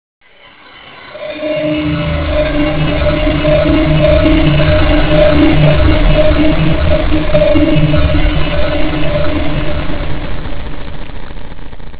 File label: drone